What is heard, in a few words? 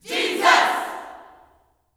"Jesus", Vocal Gospel yell shout; Spectrasonics; Vocal Planet Vol.1 Gospel; akai